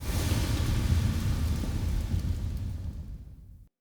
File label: Smithy Steam Burst; Ambience; Soul Plane